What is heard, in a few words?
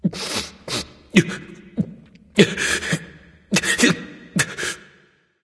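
nonverbal boohoo; smite; voicepack; ullr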